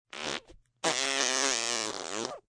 Fart Lips, Mouth effects, fart, lips fart (human voice); Sound Effects:Human; VoiceBox